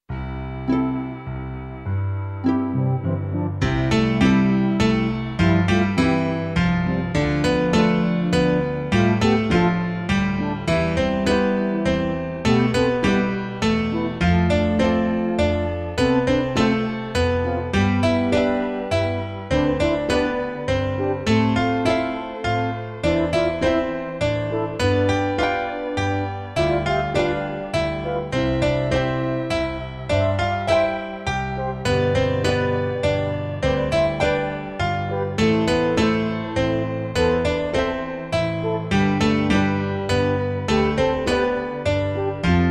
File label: waltz